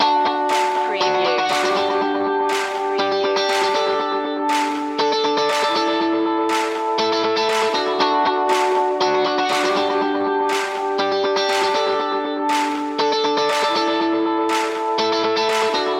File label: my motivation loop